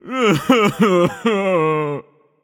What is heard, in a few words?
boohoo; smite; poseidon